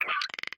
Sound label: headcrab purr; scripted sequences; hideout